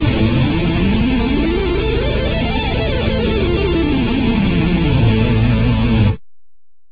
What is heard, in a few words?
phrygian fast